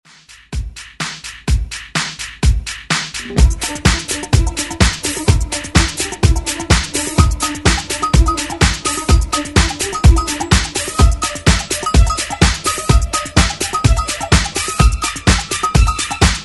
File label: 70s Re Drum 126 Bpm